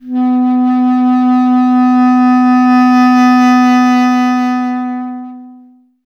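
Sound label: wind bass clarinet; Miroslav Vitous - Solo Instruments VOL-1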